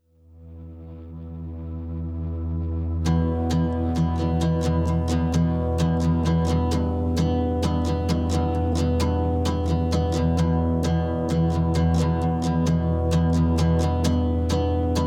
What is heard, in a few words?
Dead Leaves Vox Up